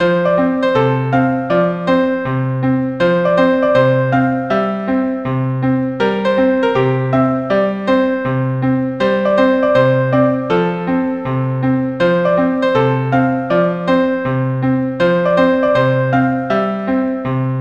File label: piano